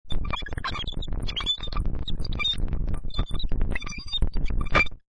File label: Glitch, Digital, Mechanical, Robotic, Computer Malfunction; Multimedia; Warped